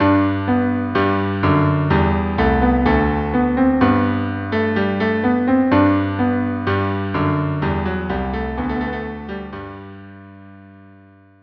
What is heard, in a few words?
March cello duo; jacquet; Cephale